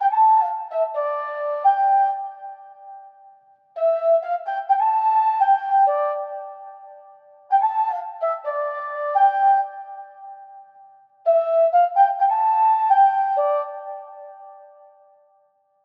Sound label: 128 BPM D Min Flute, loop stems; Cymatics; 2022 Melody Collection; Prayers